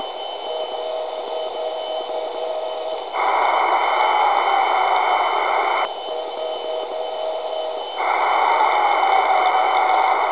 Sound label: cw